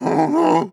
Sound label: warning, zombie vox; creatures